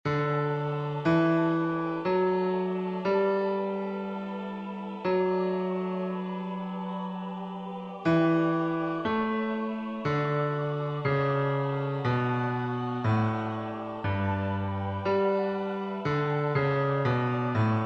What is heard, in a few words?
OBOE piano